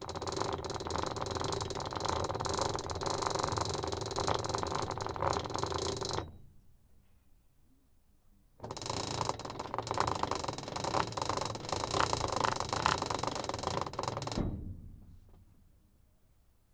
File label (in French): OUVERTURE VOLETS PAR CHAINE A VELO